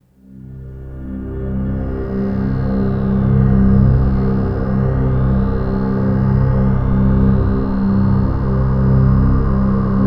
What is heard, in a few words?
Low Complex Resonator, Complex Wave; Sonic Foundry (Sony Creative Software) - James Johnson Spektral Minimalism